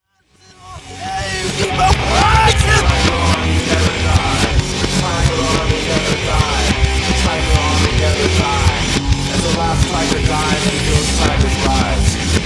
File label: reverse, psychobilly; backmasking contrario